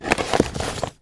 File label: paper Death; god awe full clicker 2018 gamerip